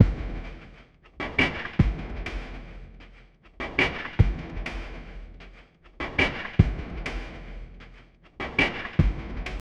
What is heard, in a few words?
tentacles loop